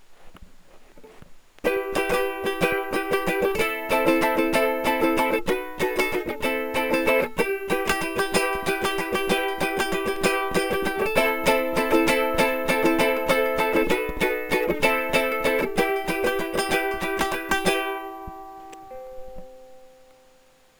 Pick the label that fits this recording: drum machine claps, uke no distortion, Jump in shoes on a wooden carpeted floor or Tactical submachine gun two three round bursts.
uke no distortion